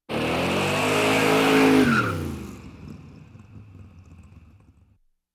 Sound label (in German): rennwagen - schnellstart (burn out); Sport